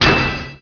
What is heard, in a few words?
klang; objects; Heretic II Demo